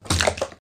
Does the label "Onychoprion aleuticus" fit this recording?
No